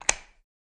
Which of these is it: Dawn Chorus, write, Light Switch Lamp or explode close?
Light Switch Lamp